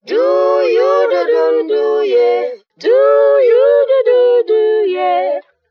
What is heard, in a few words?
voice doo yoo da doo doo yeah; Loops; Phonky